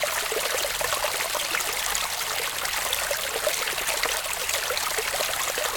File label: ambience water small fountain; smite; conquest